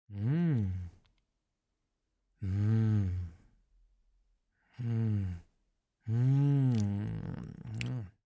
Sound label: Yummy Sounds Male Satisfied; MAD ROBOT Sound Lab; Munchies